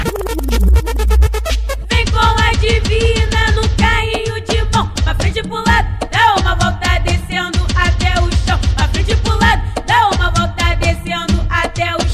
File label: Baile Funk